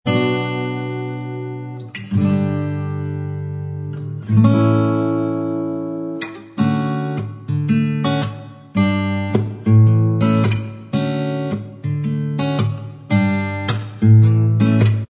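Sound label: acoustic, instrumental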